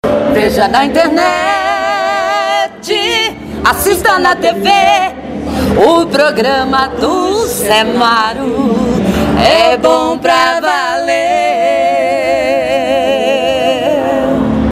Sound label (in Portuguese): vinheta cantada